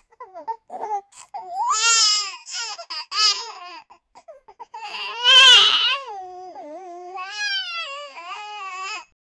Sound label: baby crying, upset